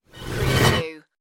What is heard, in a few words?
robot servo malfunction